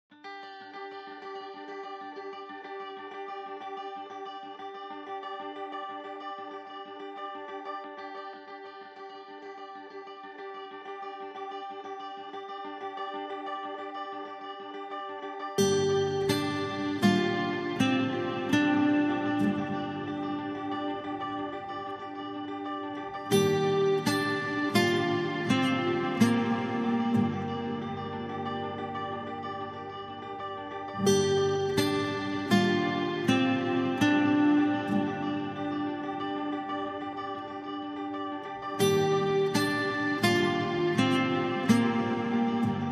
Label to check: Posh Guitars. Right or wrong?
Right